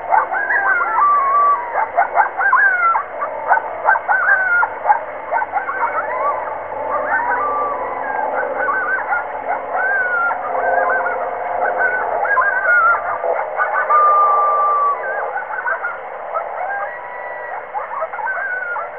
coyote; bird

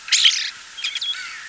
starling; bird

